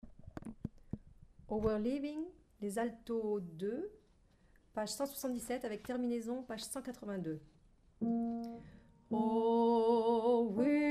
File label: Alto